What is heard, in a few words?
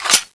clip out; g11; tfa cso